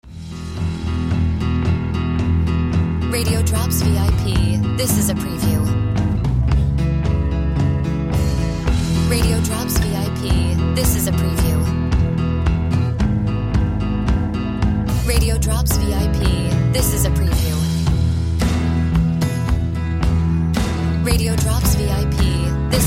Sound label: Music Bed